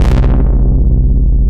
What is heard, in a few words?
Basses; Ableton; Factory Packs; Creative Extensions